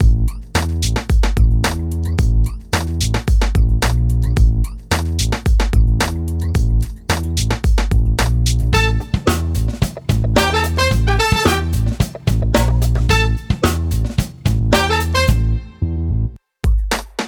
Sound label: Liquid RnB Funky move 110 BPM